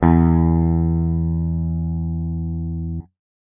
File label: guitar electronic, clean electric guitar